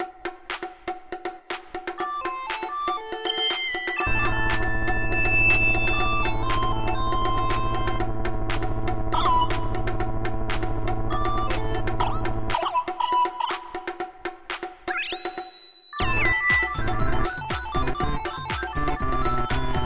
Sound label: dub